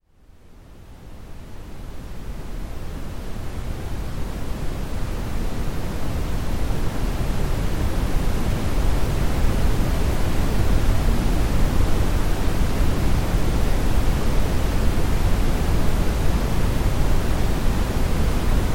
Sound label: brown noise; Eye-Bo, система аудио-визуальной стимуляции; tekhniki katalizatory